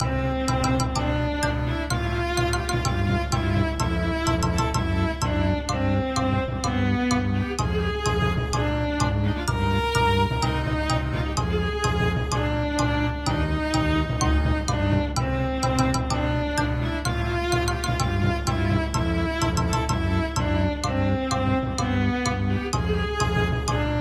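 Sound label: Good Evaluation Theme Orchestral, MIDI; Sim City SNES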